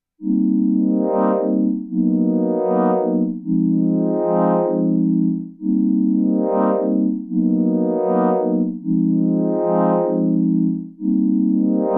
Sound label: Live Looping; Korg Time